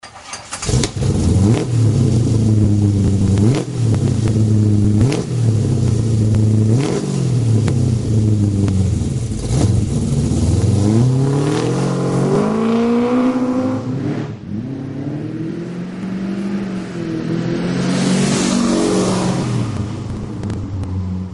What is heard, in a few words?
magnaflow exhaust systems